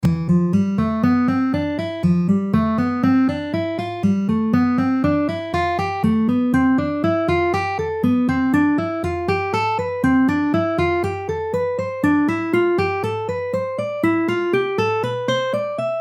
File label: Available Note Scale; 3弦A音から横移動してみよう